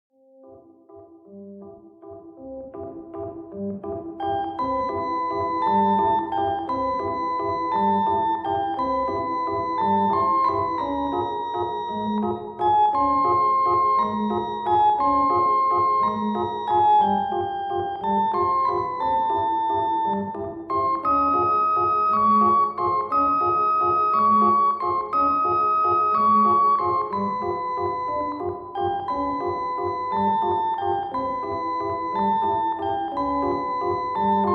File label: beggars organ